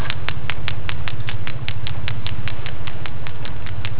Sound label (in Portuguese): Gravação cliques golfinhos